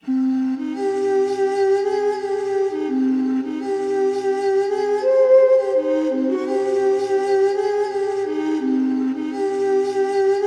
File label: Bawu, Cm, 84bpm; Little Ethnic Vol.1